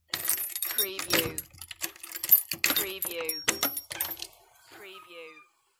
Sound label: Rattle Keys, Unlock Door, Open Door; Household Sounds / Doors, Windows & Locks